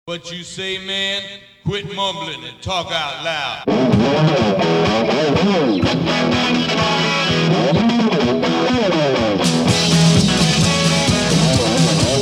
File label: MUMBLIN' GUITAR; rockabilly